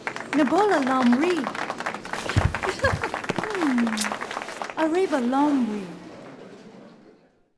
dance outro fair; Sid Meiers Pirates; XBOX

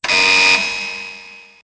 buzz; Soldier Of Fortune II Double Helix; XBOX

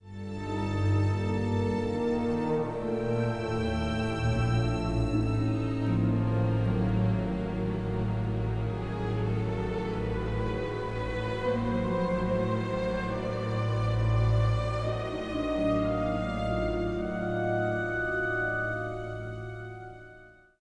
music flourish

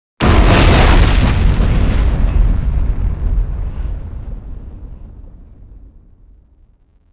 satchel blast; The Elder Scrolls III Morrowind GOTY; XBOX

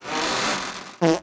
fart; Cars; XBOX; MG

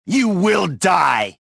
anger; reborn

